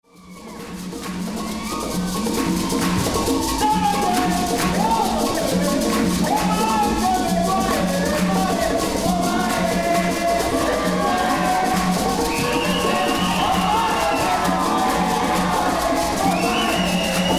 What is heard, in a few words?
african kpalango